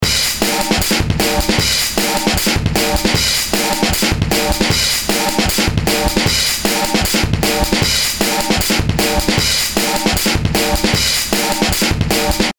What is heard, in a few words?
Distorted Block Loop, Drum Loops; Industrial